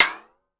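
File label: Timbale Edge Up, Drum Machines Clavia DDrum-AT Pitch Envelope; Pitch Env Various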